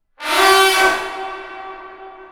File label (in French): Imitation Barissement Elephant Avec Trombone